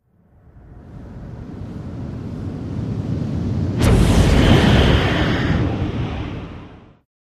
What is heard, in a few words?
Long Explosive Whoo, whooshes & swishes